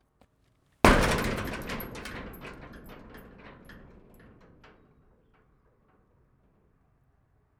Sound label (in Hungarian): kapuba valo belerugas